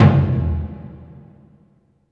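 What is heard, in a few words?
Burundi drum, Heart of Africa; Spectrasonics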